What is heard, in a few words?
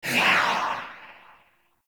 Choir Dash